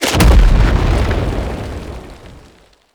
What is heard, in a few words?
distant cannon impact; Makvos; Warframe; Tau